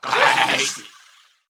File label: ICE GOAT